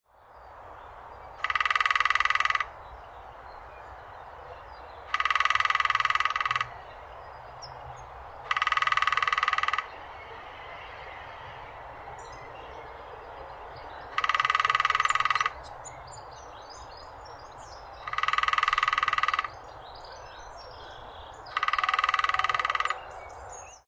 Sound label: lesser spotted woodpecker